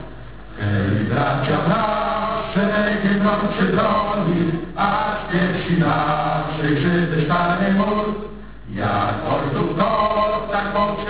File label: hymn